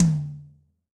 TOM

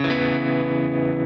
C#m9 Arpeggio down

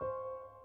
piano high

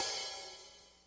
Ride

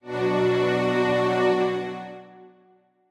Strings end

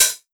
Hat Synth; osu

